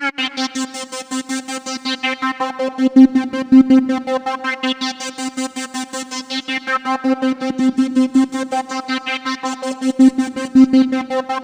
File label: Roland JV 2080 LFO C4; MISSION LFO